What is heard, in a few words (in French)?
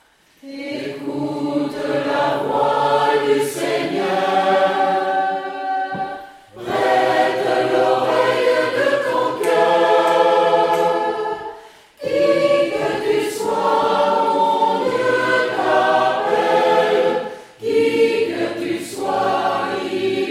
Tutti; Ecoute la voix du Seigneur